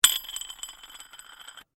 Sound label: Handle Screw Turn, Handle, Screw, Turn, Metal; Foley; Lock; Hand Tools; BlastWave